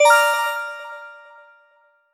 achievement; pond5

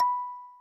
pluck

